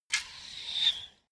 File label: bow and arrow fire fly; krieg-waffen; pfeil-bogen; soundpool